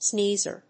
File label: sneezer